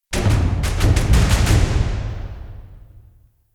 Combat Out; The Witcher 3 Wild Hunt; Novigrad

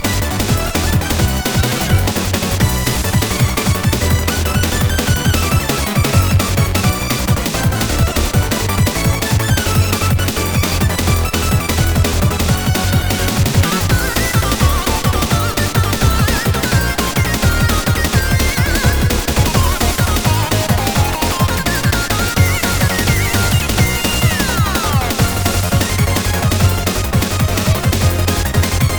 Challenge stage Loop

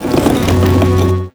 Boss Attack

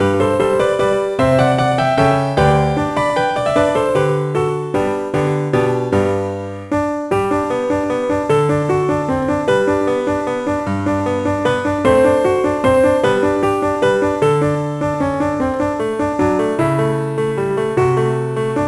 BGM PIANO ALL

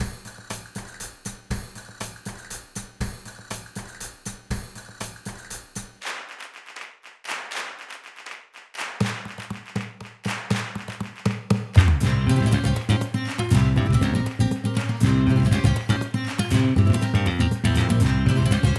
Flamenco (No Cheering); wii fit u

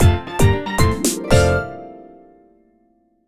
Jingle Complete; wii party the unofficial soundtrack

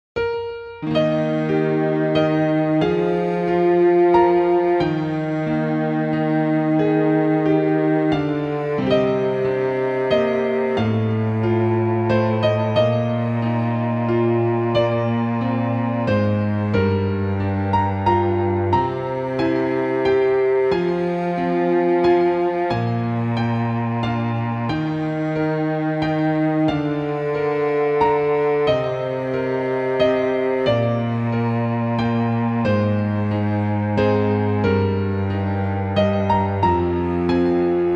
Piano & Cello

